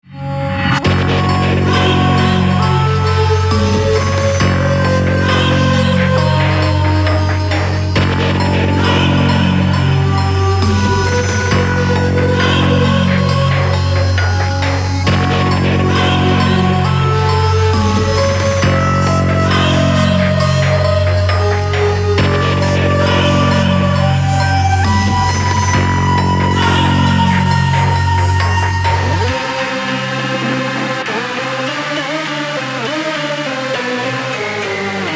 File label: BGM EVIL